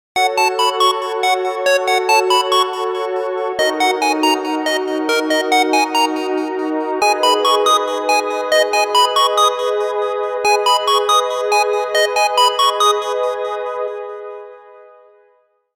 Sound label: best synth ringtone